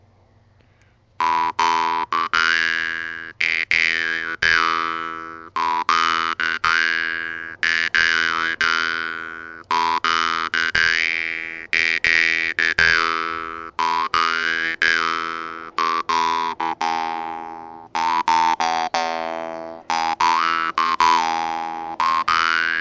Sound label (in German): Melodien